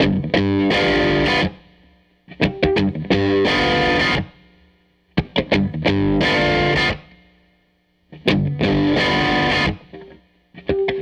Tele Guitar Loop

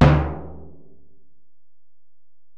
Bell, Alesis DM-PRO

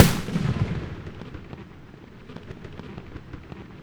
FIREKICK; Spectrasonic Distorted Reality 1; BIZZAROS

